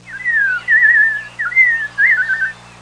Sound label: Robin bird